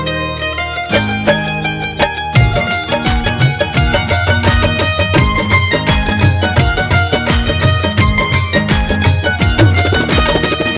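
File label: guitar music ringtone, bollywood ringtones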